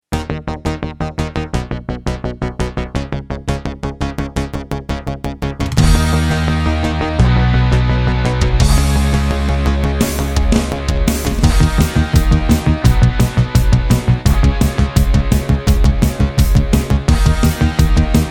blast analog rock

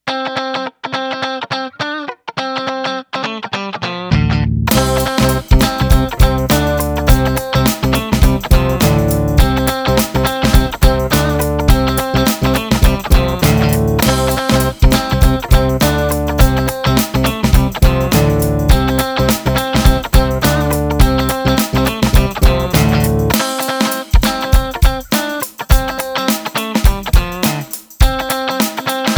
funky guitar

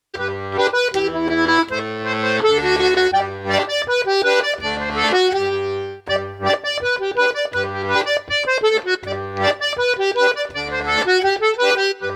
red accordion

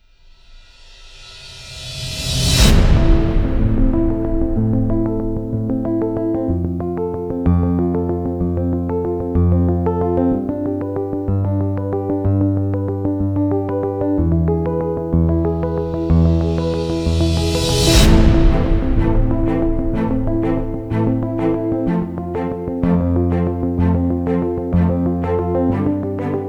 space orchestra sfx

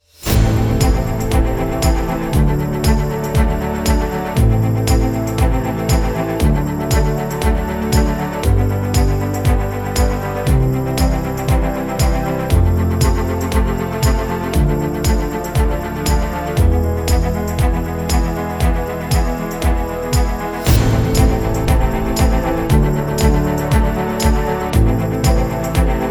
corporate sun orchestra

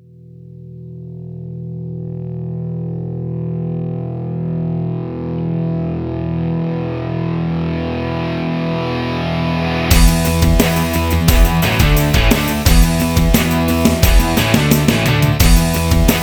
headbanging

